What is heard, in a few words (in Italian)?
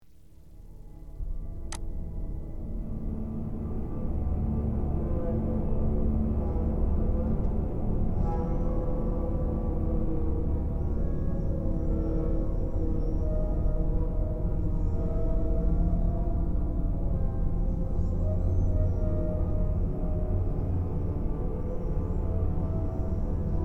tema atmosfera isola